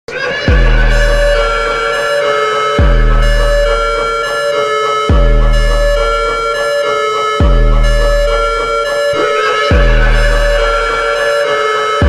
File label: attitude ringtone bgm ringtone instrumental ringtone; sigma ringtone